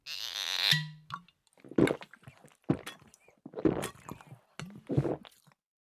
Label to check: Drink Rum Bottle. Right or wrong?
Right